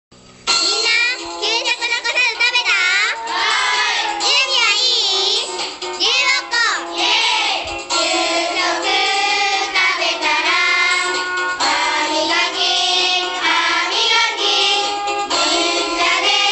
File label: brush song